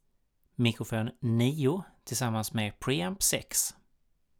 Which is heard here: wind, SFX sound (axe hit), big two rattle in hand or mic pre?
mic pre